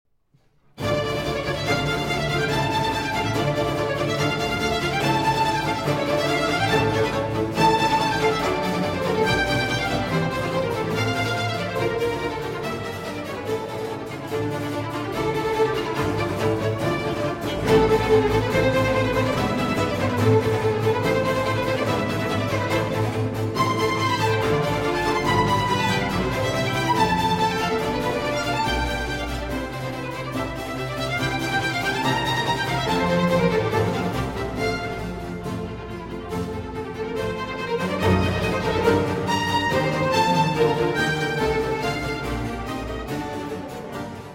Concerto for Strings and Continuo in D minor

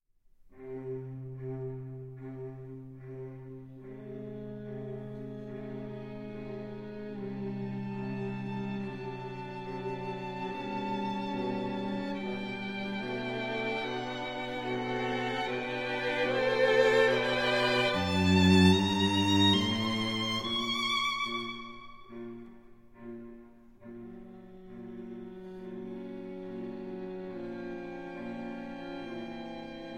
String Quartet In C, Adagio - Allegro; String Quartet No.19 In C, K.465 - Dissonance

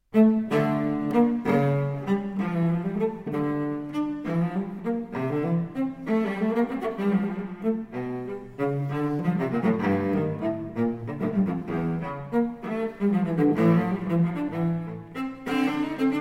Cello Suite in D Minor, Gigue; Cello Suite No. 2; BWV 1008 VI.

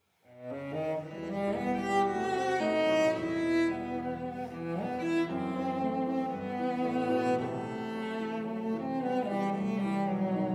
Cello Sonata in E Minor, Allegro Moderato

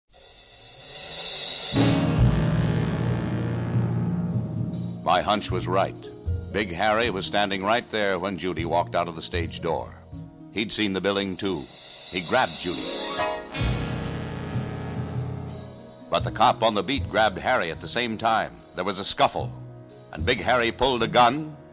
Jazz Chase

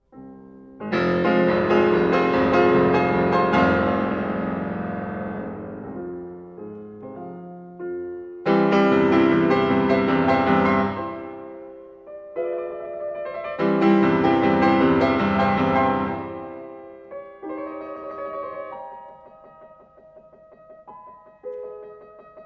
Piano Sonata in F Minor; Piano Sonata No. 23 in F Minor, Op. 57 Appassionata; Beethoven

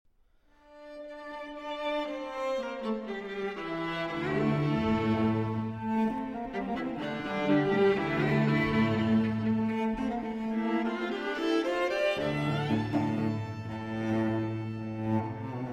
Pieces for String Quartet, Alla Tango; Schulhoff 5 Pieces For String Quartet - No. 4, Alla Tango Milonga

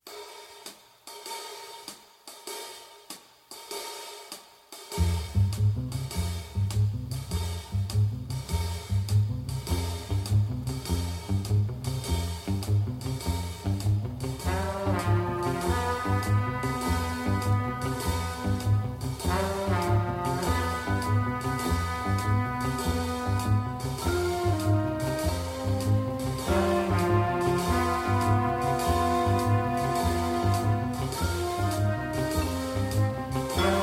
Blues; Bourbon Street Beat

